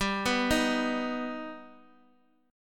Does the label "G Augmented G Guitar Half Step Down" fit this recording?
Yes